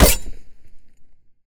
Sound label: Machete Hit; zps; Weapons; Melee